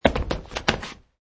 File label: Stagger; Footstep - Man wearing jeans and hiking shoes staggers on wooden floor surface